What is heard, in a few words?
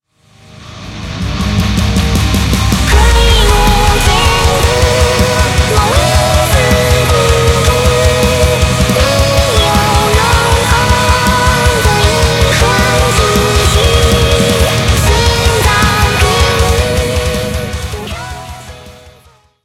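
background music crossfade; Invaxion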